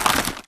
hack spectre armorrattle; dataknife; Titanfall 2

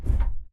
footstep jog; Titanfall 2

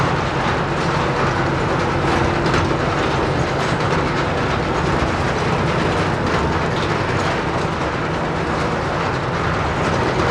Prop Warehouse Prop Rack Move Mid; Titanfall 2; Boomtown

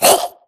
grunt death neck; Titanfall 2

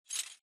imp bullet lightballistic tile debris; Titanfall 2

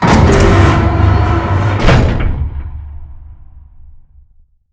horizontal panel move; Titanfall 2

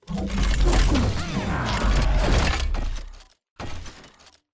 weapon stow; Titanfall 2

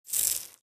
bullet light ballistic sand debris; Titanfall 2

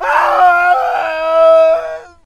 ai death; Titanfall 2

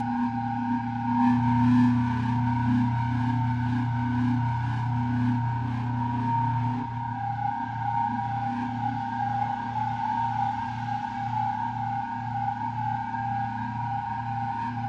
generator scifi; boneyard; Titanfall 2

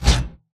bt footstep jog parts; Titanfall 2

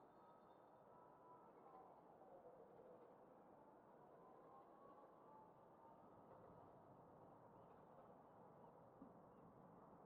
closed room; angel city; Titanfall 2

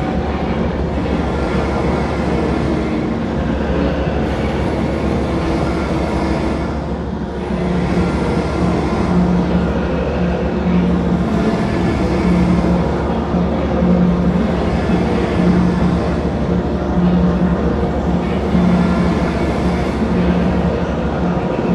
mechanical rotator; Titanfall 2

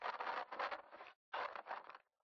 static; Titanfall 2

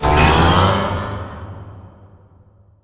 Prop Rack Start Close; Prop Warehouse; Titanfall 2; Boomtown

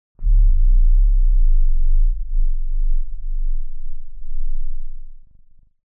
Giant Floor Panel Assemble Final Lift LFE; Boomtown; Sideways Town; Titanfall 2

